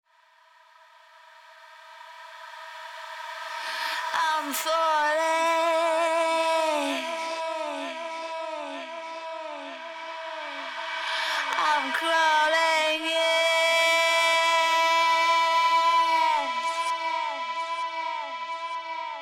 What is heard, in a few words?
Accapella